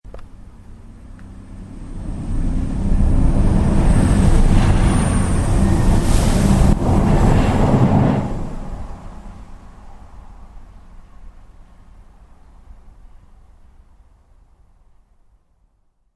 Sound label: railway bridge; grandpont